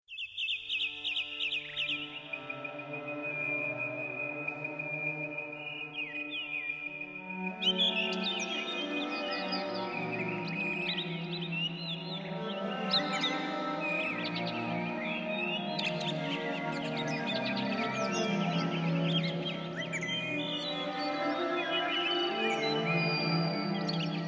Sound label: cello